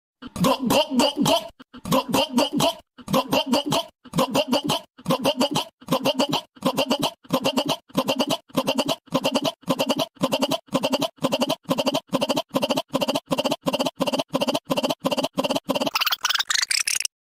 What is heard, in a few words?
gulp gulp gulp gulp sound effect; Tik Tok meme